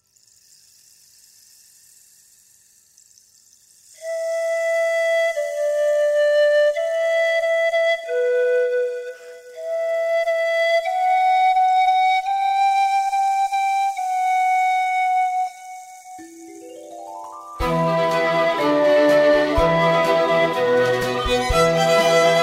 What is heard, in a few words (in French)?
vent frais vent du matin instrumental; frischer morgenwind